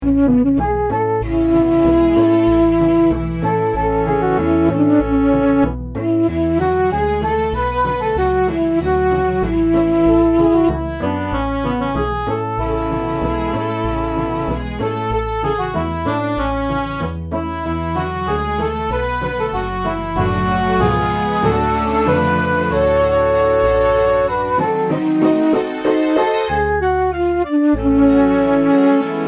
Inspirational Show Tune

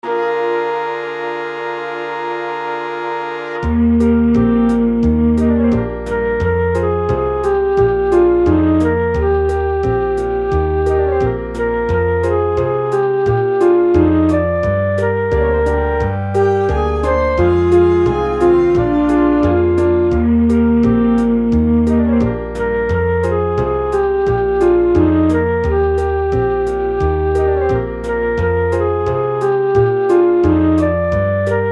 bouncy show tune

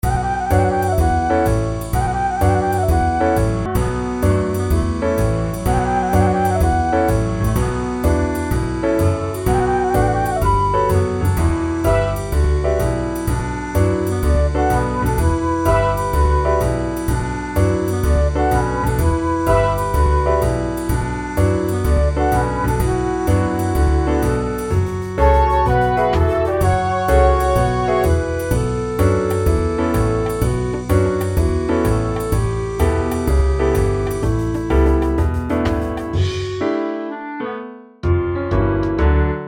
Jazzy

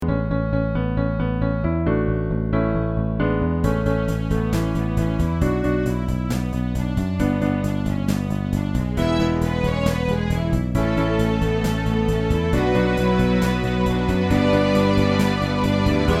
high energy pop song

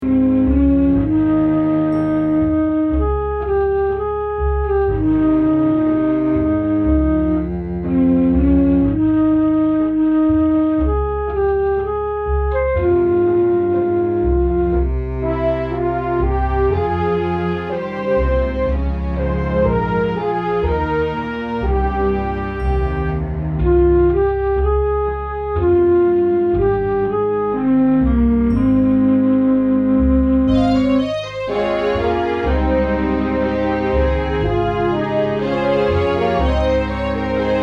Inspirational

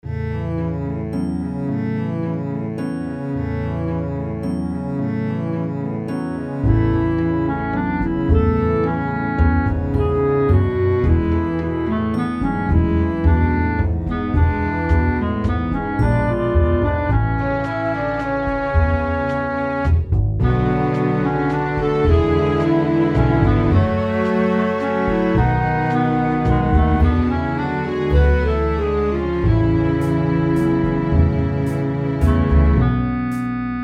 Serious Show Tune

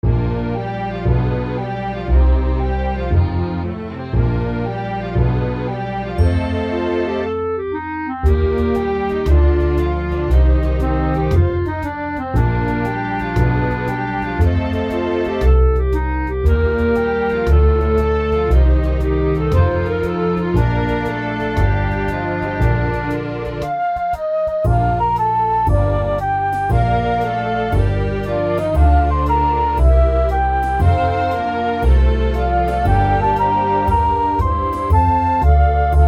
Light Show Tune

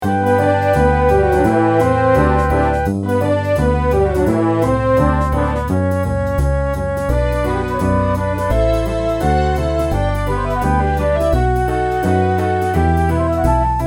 bouncy show tune

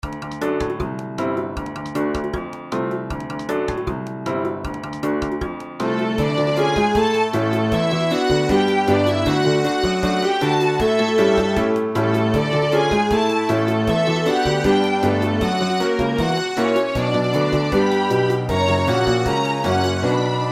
bouncy show tune

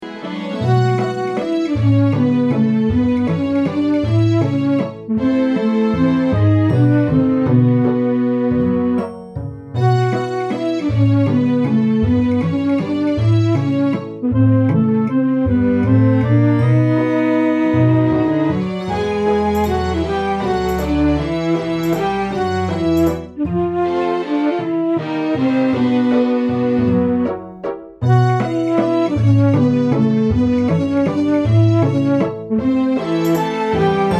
serious show tune

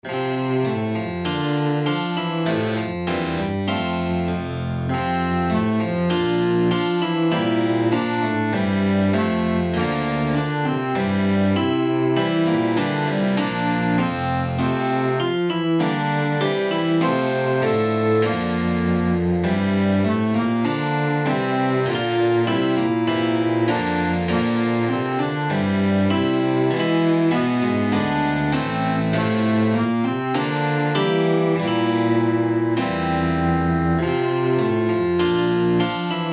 A Stately Dance classical

